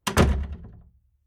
Household Door Small Door Closed Heavy Impact And Wobble; Miscellaneous Door